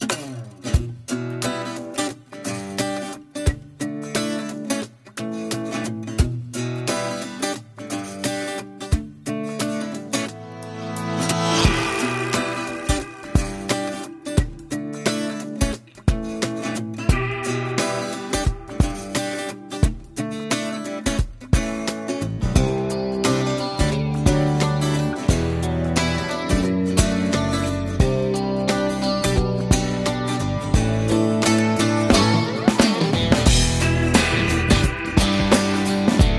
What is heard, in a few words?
Quirky acoustic BPM 88